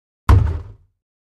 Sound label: Large Bin Hit Sledge, plastic